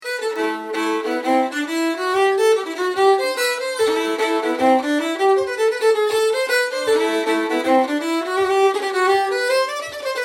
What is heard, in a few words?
Fiddle